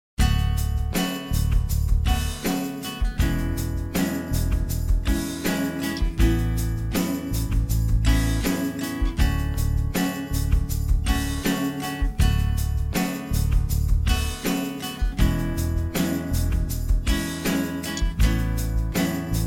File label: Study Piece, Example, 80bpm, guitar